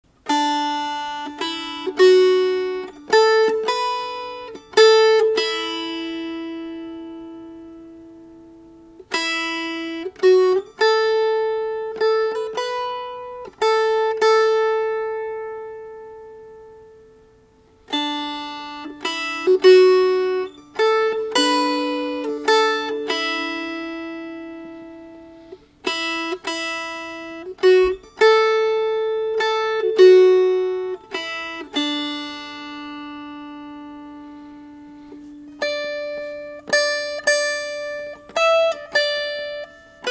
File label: Melody